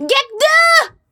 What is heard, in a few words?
Final Boss Yell